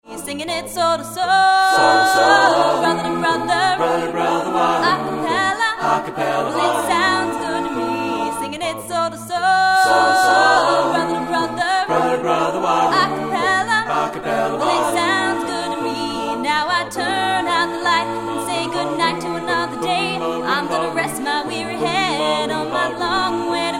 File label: a cappella